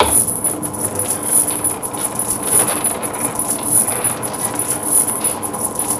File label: door; horror game